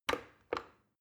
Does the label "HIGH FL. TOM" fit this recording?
No